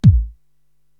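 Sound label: bass drum Dance, Korg TR-Rack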